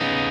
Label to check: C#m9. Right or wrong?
Right